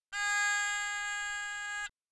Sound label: haegeum